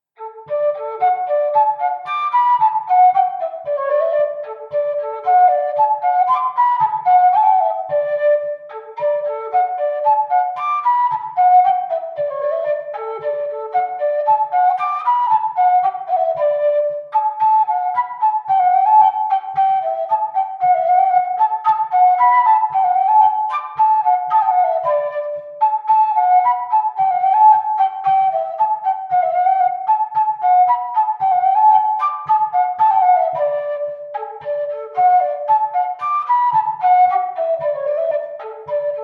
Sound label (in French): Bourree; Recueil De Raoulx